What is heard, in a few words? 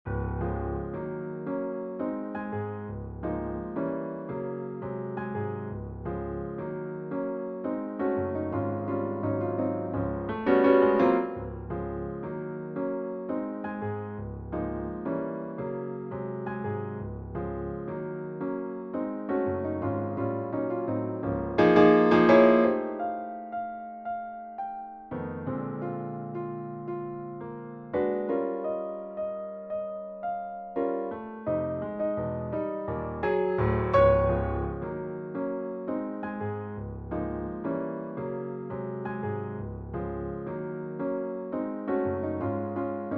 Piano Solo